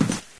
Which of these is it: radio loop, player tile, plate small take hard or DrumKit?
player tile